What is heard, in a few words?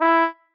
marimba trombone; ゆめ2っき